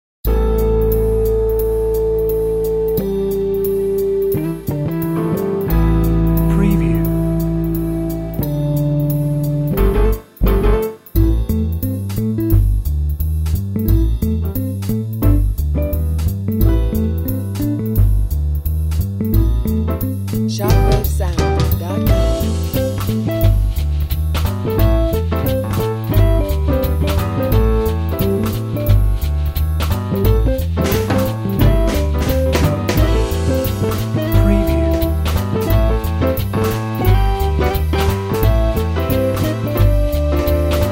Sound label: Soft Jazz